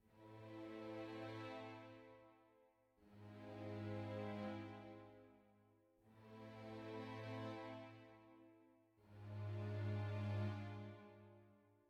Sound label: sad soft start